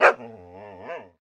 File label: panic, dog